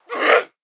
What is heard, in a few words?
attack, zombie; DayZ-Epoch; dayz sfx